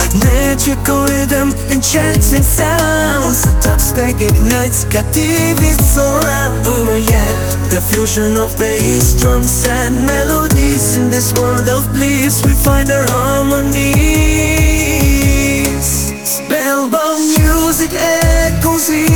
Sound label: Magical rhythm